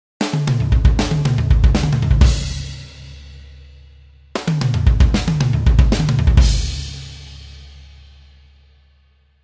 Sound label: Fill